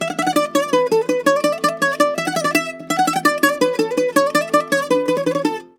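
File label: F#, bouzouki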